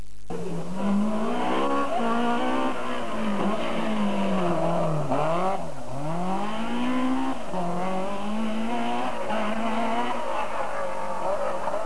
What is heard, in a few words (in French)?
porsche epingle, Porsche 911